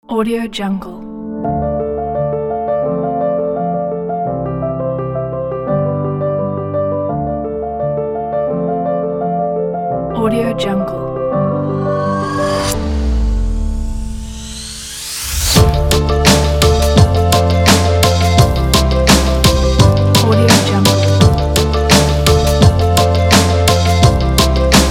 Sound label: abstract motivation triphop